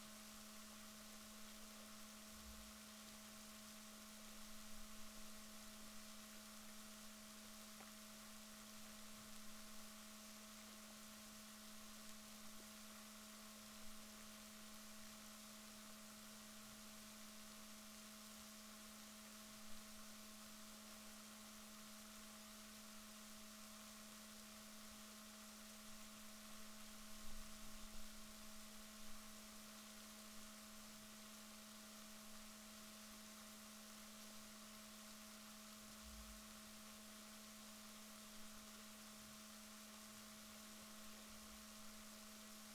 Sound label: rorquals negatif, Balaenoptera Physalus Chile